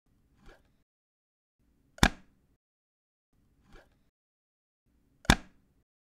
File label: wooden box